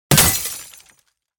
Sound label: neon tube smash